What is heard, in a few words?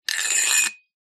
small metal object coin slide inside metal pole; foley